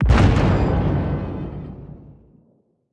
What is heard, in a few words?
damage hits explosions, Warships